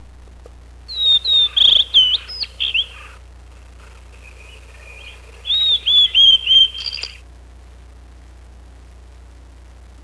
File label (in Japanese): ooruri